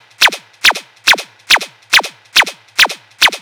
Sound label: NRG Percussion, Trance; Sonic Foundry (Sony Creative Software); Trance NRG VOL-1